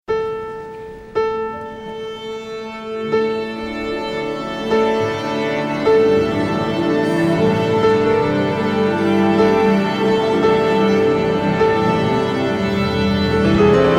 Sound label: Piano Concerto, ringtones